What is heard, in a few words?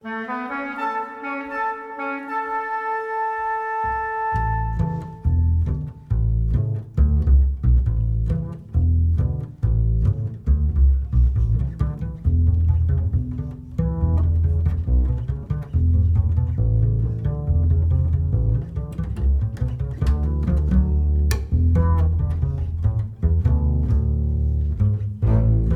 sketches for bass orchestra